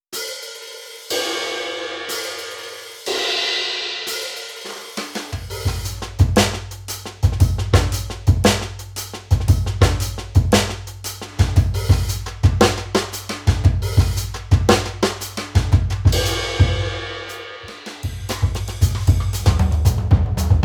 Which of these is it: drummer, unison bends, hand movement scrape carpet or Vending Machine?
drummer